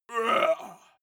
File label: male shout hit